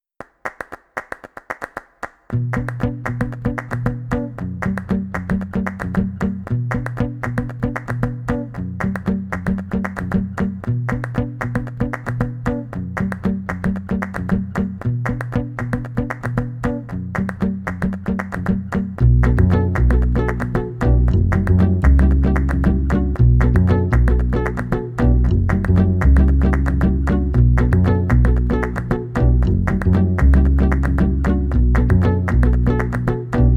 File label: flamenco vibes 432 hz